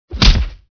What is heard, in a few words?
punch